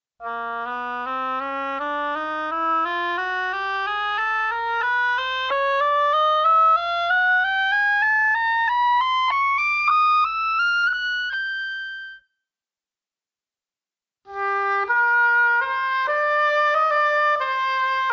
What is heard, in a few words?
oboe